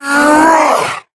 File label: Attack Roar; Warframe; Sargas Ruk Boss